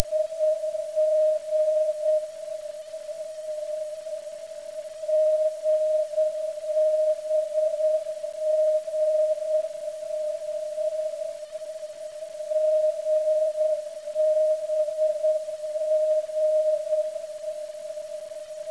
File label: vox